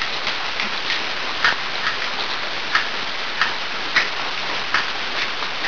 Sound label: rain